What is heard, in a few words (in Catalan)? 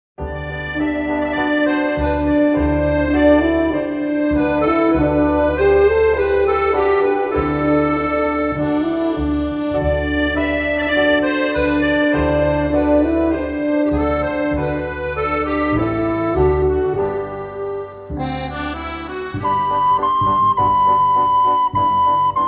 amb flabiol